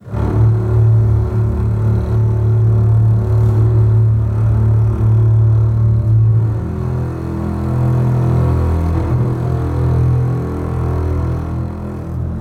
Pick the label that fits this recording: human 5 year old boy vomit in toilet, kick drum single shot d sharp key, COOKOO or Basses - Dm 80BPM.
Basses - Dm 80BPM